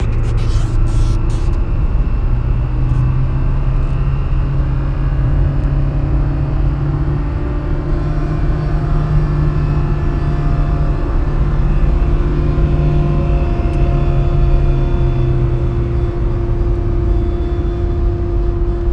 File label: low pitch whistle over 70mph; 2nd generation ge 08-13; 2013 fit